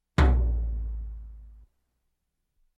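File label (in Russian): звук турецкого барабана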